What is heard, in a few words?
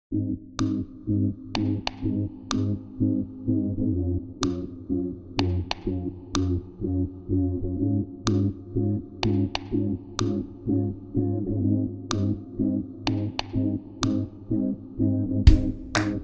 Drums and Vocals Only